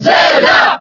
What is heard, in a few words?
Cheer French; Zelda; SSBB